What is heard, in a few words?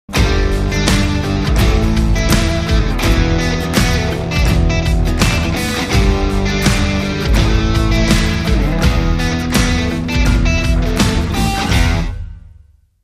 Deep Desert Drive Bumper